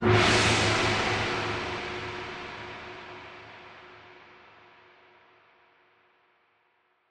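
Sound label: Gong Gong Single Hit Medium, percussion gong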